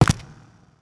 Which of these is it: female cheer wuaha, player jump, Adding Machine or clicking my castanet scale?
player jump